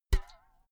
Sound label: filled can hit bounce ringing